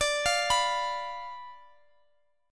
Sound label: D Minor 6th Guitar Open G